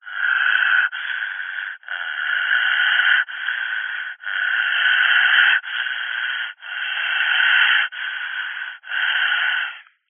human heavy breathing down telephone receiver weird groans creepy; zapsplat